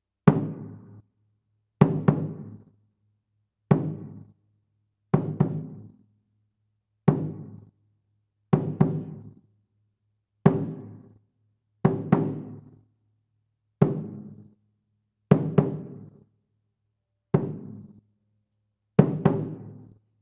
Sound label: Drum